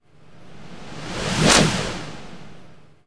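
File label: FX right, attacks; Best Service Advanced Media Trax Modern Composer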